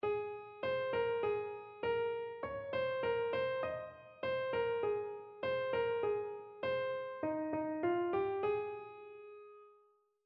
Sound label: melodic error detection; ear training